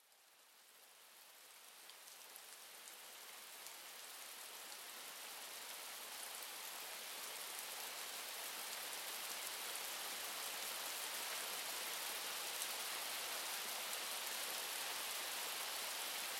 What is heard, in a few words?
Relax Music; PIYANO